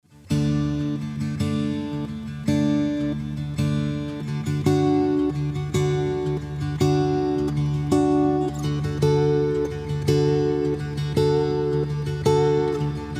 Trumpet

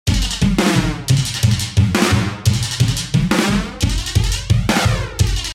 176 FLANGER LOOP

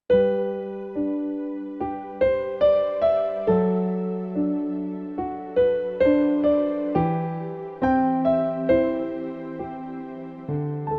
Piano Love Songs

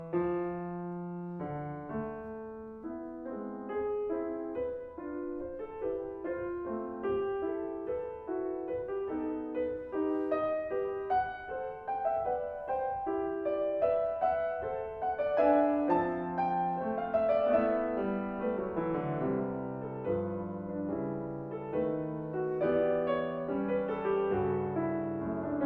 Faure Quatour a Cordes

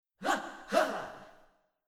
Princess Evade Backward VOX

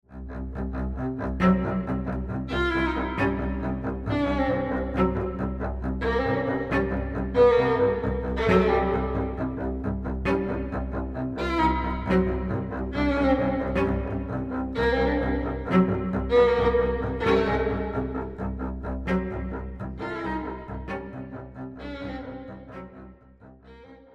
Chase Music

